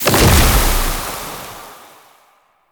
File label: Grenade Explosion Toxin; Warframe; Entrati; Alchemy